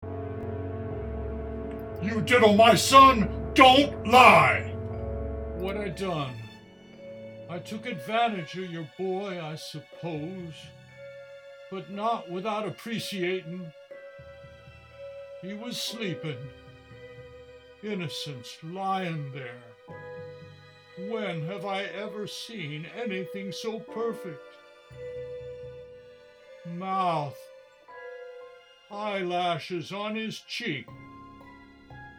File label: audition with voice; Ariel